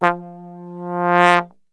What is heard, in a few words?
F 1, Brass; Best Service; Brass Super Section